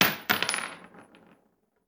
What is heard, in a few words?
Bullet Shell; Sound Ideas; Guns